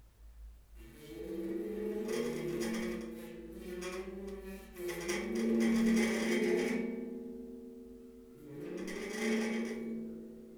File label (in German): Rubber Mallet mittel Notenständer